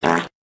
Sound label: fart sound effect